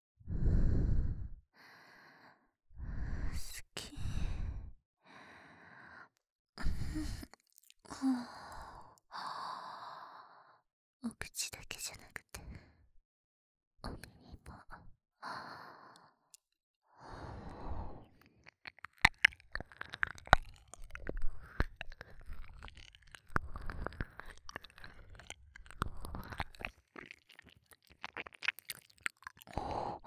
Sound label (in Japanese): 姉さんの好き好き濃厚耳舐め, ASMR